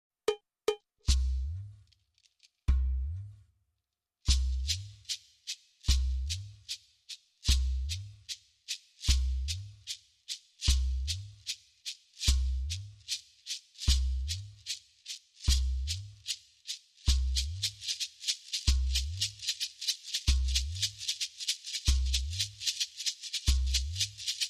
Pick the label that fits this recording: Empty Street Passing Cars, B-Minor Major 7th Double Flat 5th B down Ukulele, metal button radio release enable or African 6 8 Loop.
African 6 8 Loop